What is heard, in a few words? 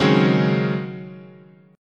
C sharp Major 7th Suspended 2nd Suspended 4th, guitar chord